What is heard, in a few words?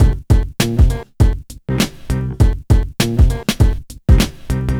HipHop Combo F maj; Digidesign; Pro Tools Essential Loops